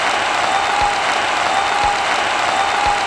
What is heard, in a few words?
crowd